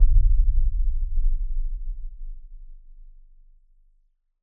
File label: Impact Boom Rumble; bottlerocket